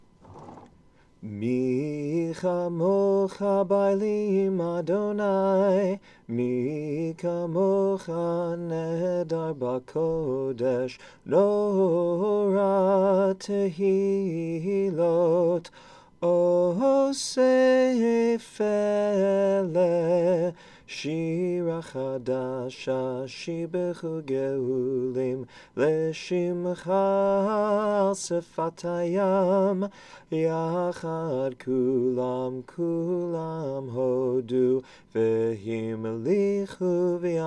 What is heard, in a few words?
Mi Chamocha morning melody